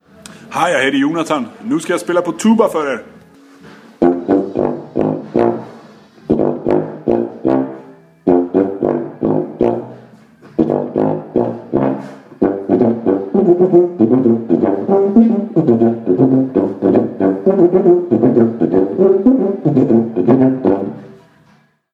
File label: tuba; prinscalibutsrejse